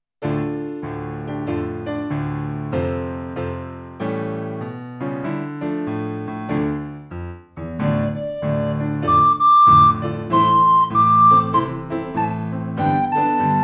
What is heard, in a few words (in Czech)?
flétna + klavír; Monostori zöld erdöben; Flautoškola 2 – METODICKÉ NAHRÁVKY a DOPROVODY